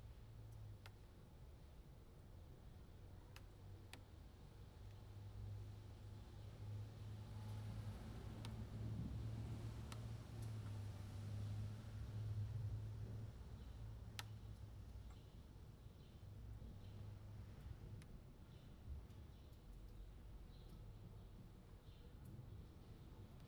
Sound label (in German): Regen auf Fensterbaenken; 6 Kanal